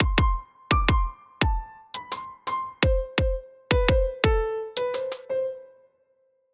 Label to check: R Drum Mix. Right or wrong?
Right